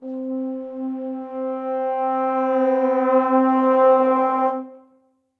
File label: Erosion (Slide) Brass Quartet; MPE; Ableton; Factory Packs; Spitfire Audio